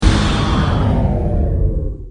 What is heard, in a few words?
Warp Stop; Star Trek